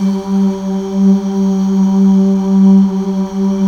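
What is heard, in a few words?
Male Uuh; Best Service Gigapack 1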